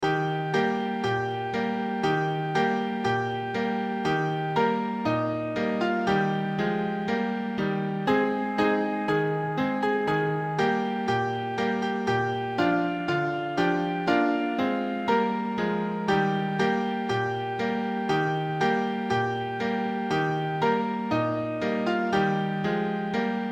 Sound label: jingle bells, instrumental